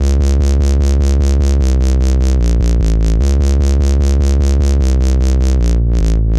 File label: 150 C Bass Loop